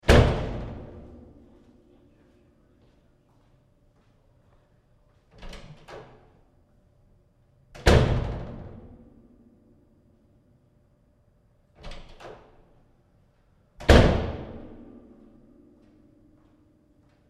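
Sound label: Prison Cell Door, Open and close - heavy cell door x3; Tom Hutchings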